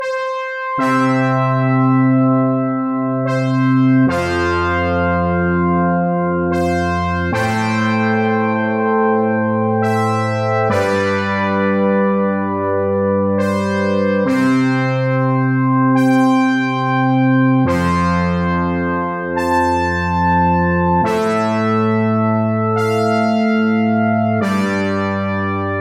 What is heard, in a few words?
Eclipsing Analog Horns; Instruments; MainStage